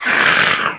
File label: death harpy, creep deaths; dota